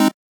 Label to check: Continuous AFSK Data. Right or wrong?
Wrong